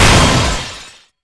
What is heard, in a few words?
Ice Orb Target